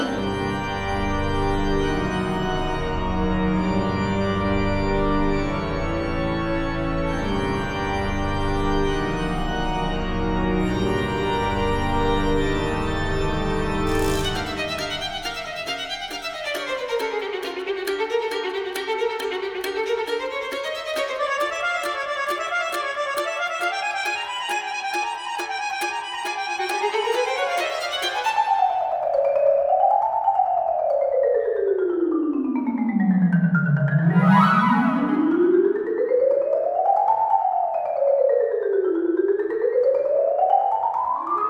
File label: Boss Intensity; spongebob squarepants the cosmic shake; ps4 ps5 switch windows xbox one xbox series xs